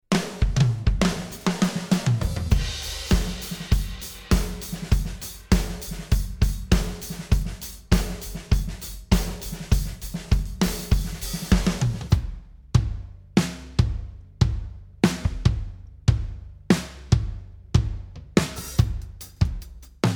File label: Drum; Blue Grotto Sound